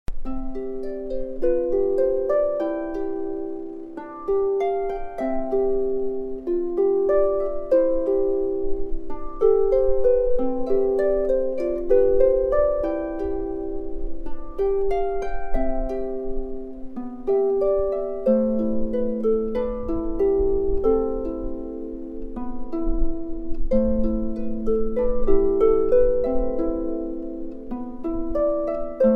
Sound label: Reverie, Harp